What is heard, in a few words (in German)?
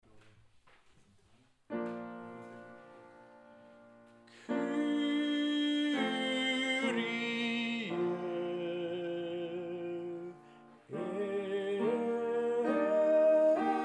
Messe Kyrie Stimme 1; Fuerst Pless